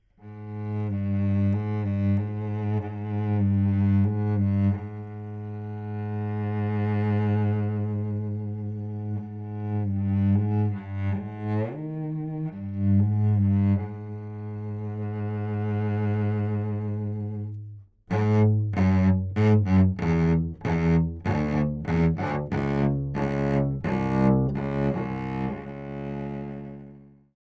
cello; AKG 414B ULS

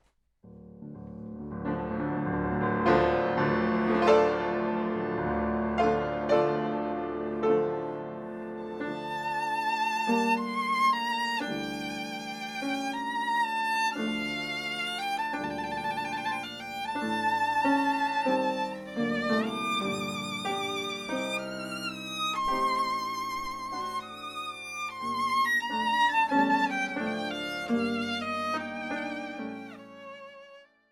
PIANO; VIOL; U67

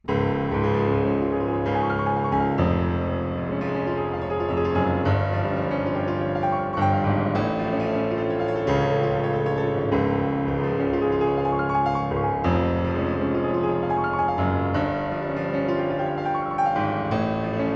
piano TLM 103

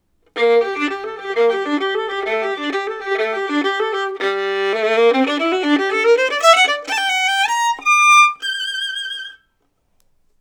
VIOLIN; TUBE

